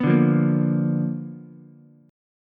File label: B Minor Major 7th Flat 5th Ukulele; strummed; JGuitar